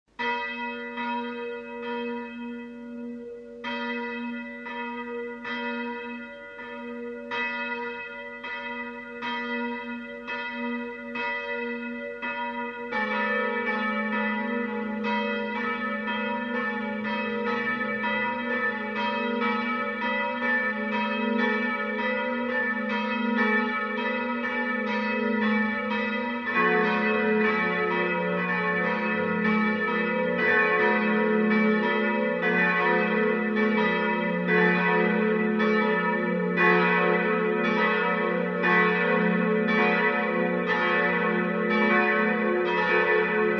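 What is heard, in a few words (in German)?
Tonaufnahme fis1 a1 h1, mehrstimmige Geläute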